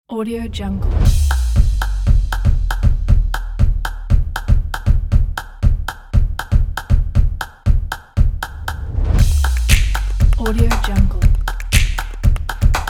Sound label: clap